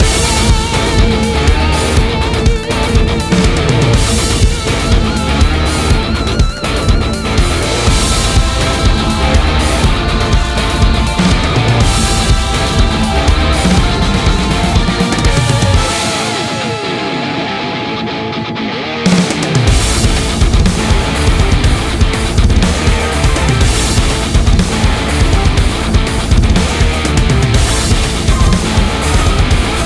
Battle Theme; Canto II Battle Theme C2; Limbus Company; ios mobile online

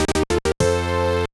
Bonus Clear; Kirby Nightmare in Dream Land

